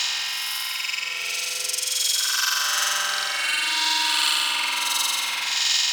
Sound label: Fragment Hunt Loop; Warframe; Cephalon